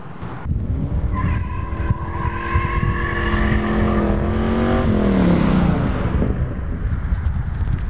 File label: sound mustang, custom